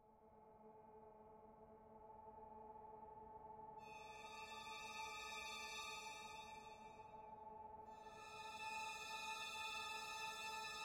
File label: ambiant dark